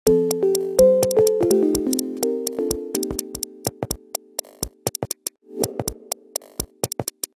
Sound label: Heather, phonetones, Nokia 7710